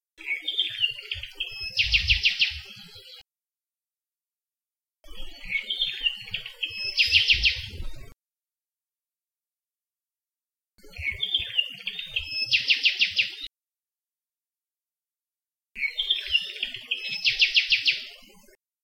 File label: apapane; Saddle Road Birding